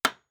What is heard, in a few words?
Nail; Fantasy Sounds Bundle; Craft